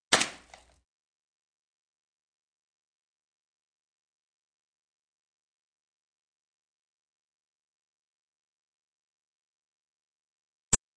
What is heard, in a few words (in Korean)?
Fights, 싸움-전투; 헐리웃특수효과음